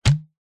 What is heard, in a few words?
cartoon pop suction cork